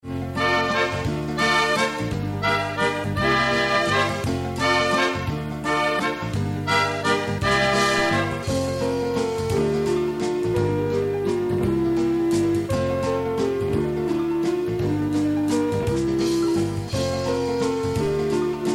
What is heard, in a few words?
melodiya; muzykalnaya skazka